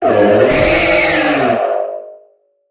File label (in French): Cri Méga